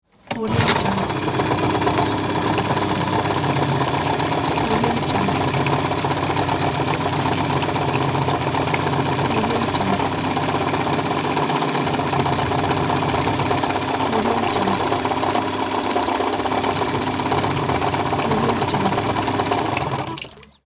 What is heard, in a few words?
outboard motor boat